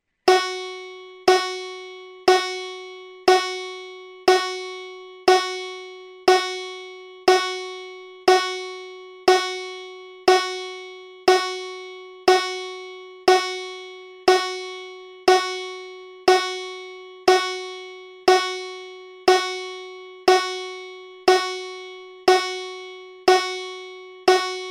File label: Fis4 Banjo